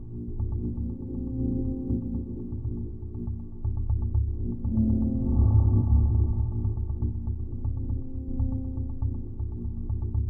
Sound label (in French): ambiance Fond Sonore